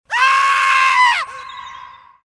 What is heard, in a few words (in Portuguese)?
grito do bicho; zoeira